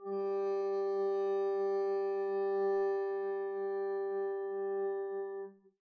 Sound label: arco g3 pp down, double bass; dsmolken